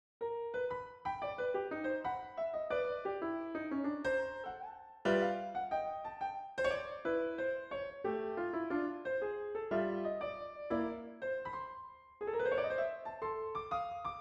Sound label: Jingle Bells Jazz Violin Solo